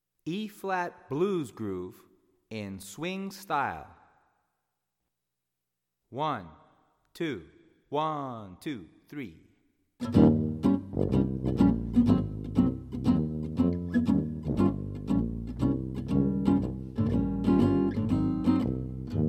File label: Eb Blues Groove